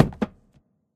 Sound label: phys cube, physics